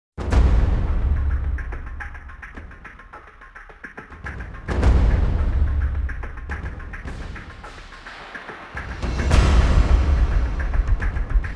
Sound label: percussion only